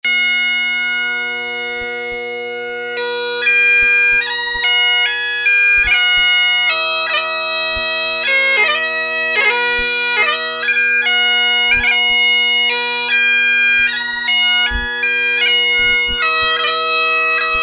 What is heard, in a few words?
Wedding Waltz, bagpipe